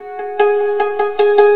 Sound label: suntur, stringed instruments; Sonic Foundry (Sony Creative Software); World Pop